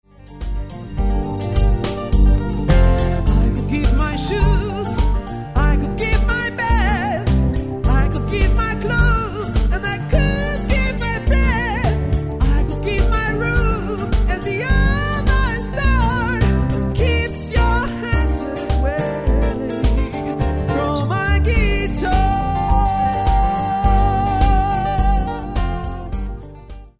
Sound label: guitar